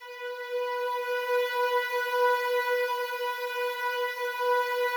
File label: slow strings B3, Yamaha VP-1; 90 s Sample CDs; Music Store